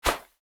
Whoosh, Organic, Fabric, Glove, Airy, Breathy, Punchy; Airborne Sound; Organic Whooshes